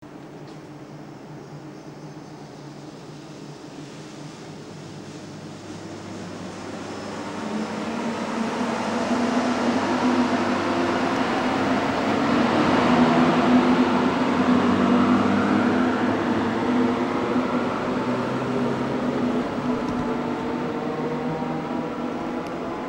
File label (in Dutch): 1843 aanzetten